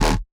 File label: Bass D; Kinda Yoy Bass